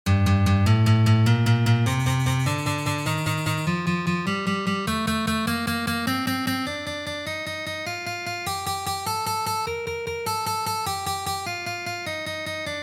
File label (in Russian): Uprazhnenie na peremennyj shtrih